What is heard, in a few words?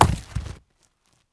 hit concrete block; Teen Titans Go! - Raven's Rainbow Dreams